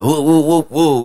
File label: woo woo woo woo; ooh ahh; ho hoo woo